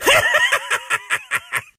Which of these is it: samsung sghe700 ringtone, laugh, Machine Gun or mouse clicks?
laugh